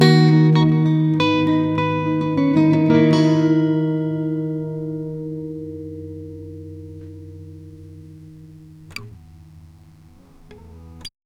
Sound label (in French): une mlodies de guitare acoustic guitar